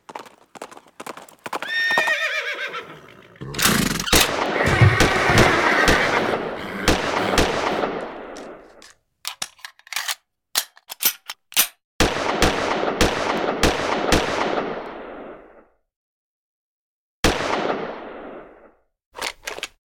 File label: ringtone